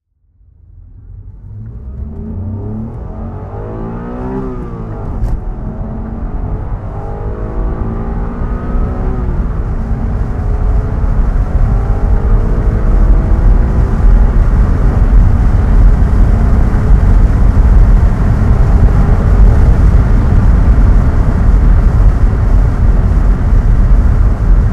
honda NSX driving; Honda NSX, 1991; Engine sound driving; V3D/ ClassicarImages; Marc Vorgers

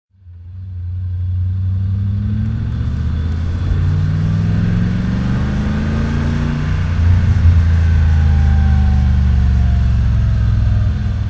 morgan +8 39 driving; Morgan +8 3.9 Litre, 1993; Engine sound driving; V3D/ ClassicarImages

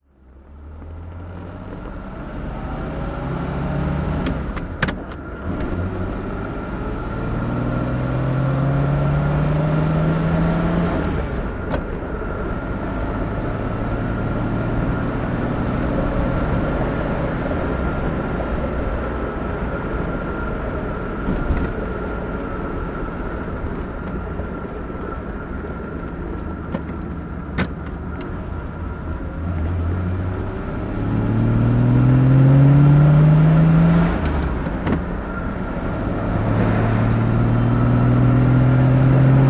mercedes 220 cabrio driving; Mercedes-Benz 220 A cabriolet, 1954; Engine sound driving; V3D/ ClassicarImages; Marc Vorgers

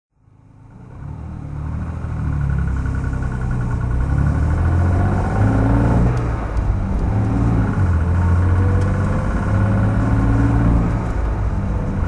jaguar xk150 38S FHC drive, Engine sound driving; Jaguar XK 150 3.8 Litre 'S' FHC, 1959; V3D/ ClassicarImages; Marc Vorgers

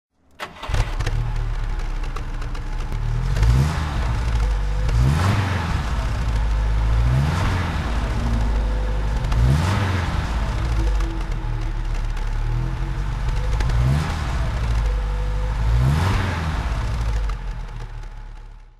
mercedes 190sl throttle

